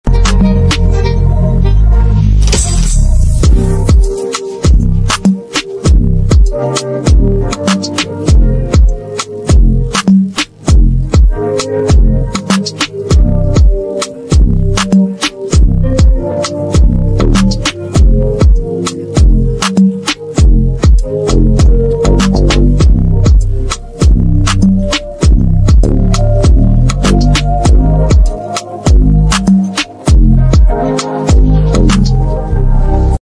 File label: Instrumental